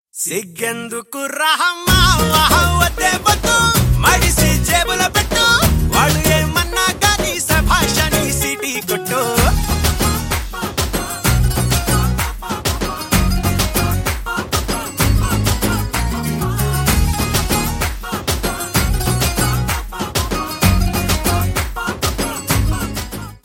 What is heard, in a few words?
Bgm